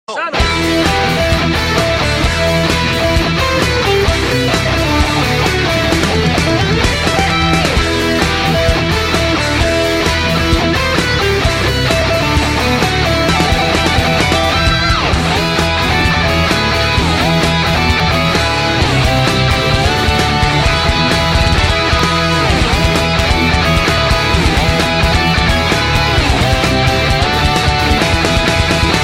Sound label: Metal Mix